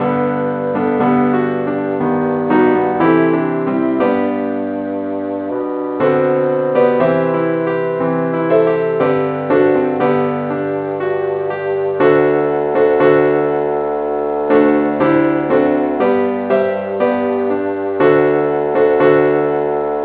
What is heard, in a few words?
keyboard key C